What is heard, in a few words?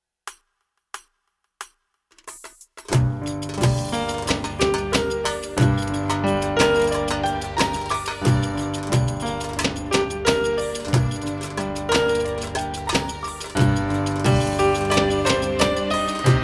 Masmoudi Music, bellydance music